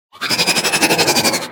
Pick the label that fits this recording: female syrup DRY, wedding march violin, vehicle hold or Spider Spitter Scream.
Spider Spitter Scream